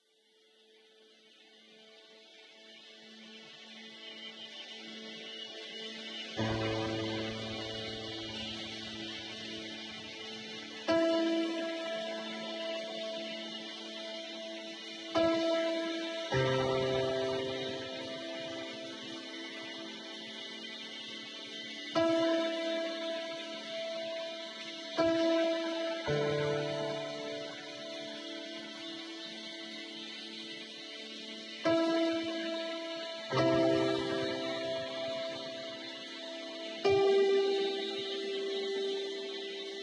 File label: Harbour Sting; ether one redux gamerip 2015